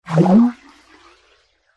Underwater Spear Bys, whoosh

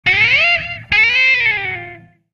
Music Guitar Wolf Wh

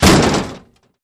Metal Impact Solid

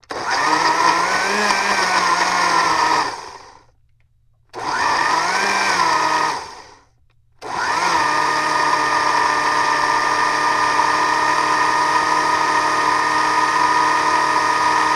Blender Banana Strt

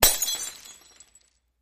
Glass Crash

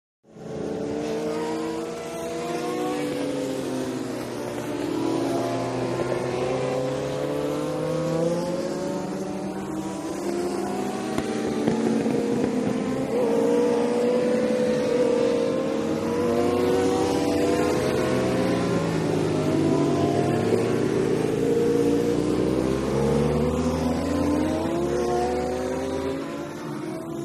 Motorcycle Road Racing

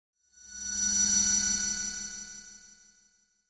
Alarm Comp Dig Hi Fre

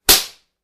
Face Slap, flesh

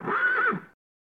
Horse Whinny Neigh Di

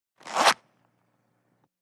Pants Zip Unzip; zippers

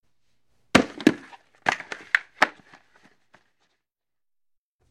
Football Helmet Pic, football

